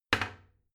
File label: toilet seat slam regular; haus-garten; badezimmer